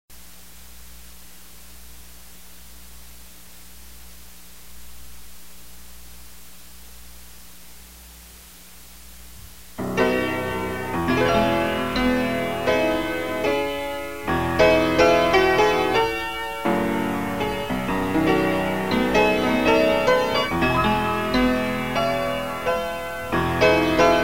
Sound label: PIANO